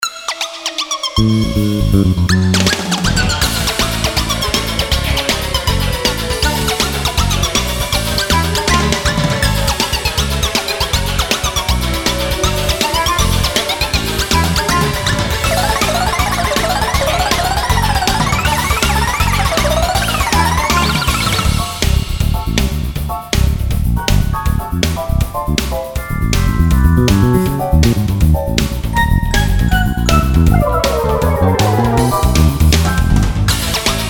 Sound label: Jig